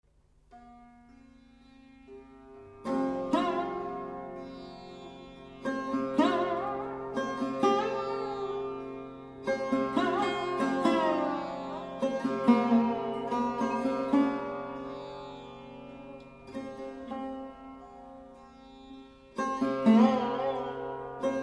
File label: Hindustani Raga